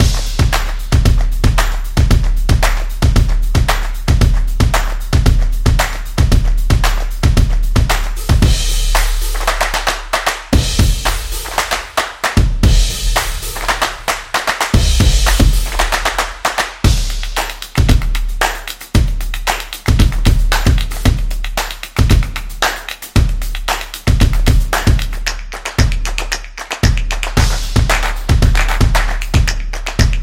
Magazine, Sport percussion background music created for action, competition; sports beat